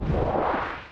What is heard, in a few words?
MOVE; C64 Dreams; Multi-Sound Default